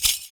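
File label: PERC, percussions; Univers Sons - Raricussions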